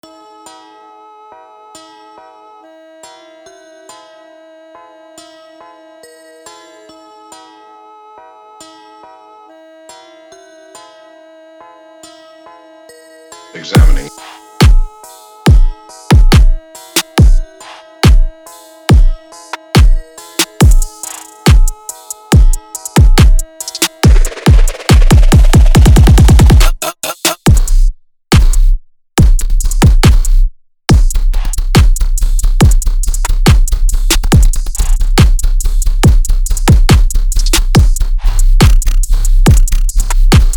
plug loop, dubstep

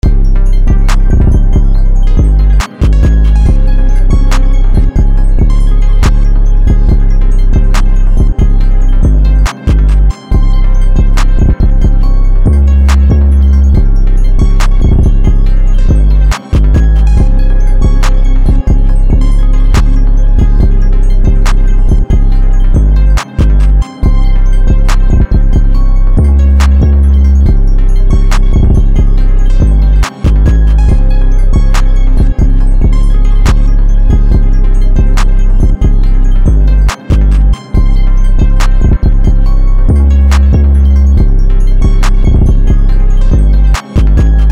fl keys

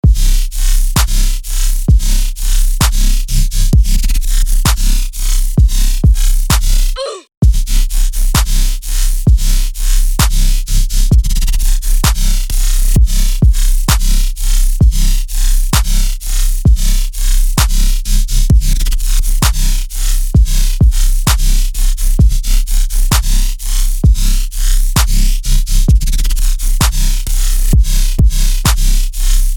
almost sick dubstep

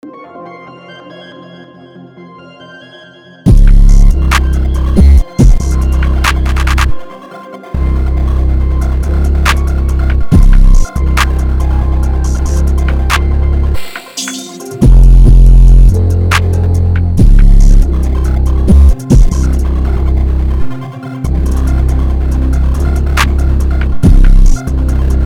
beat

